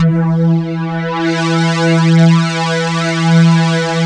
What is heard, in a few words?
other synths; Rubber Chicken Software Sample Bank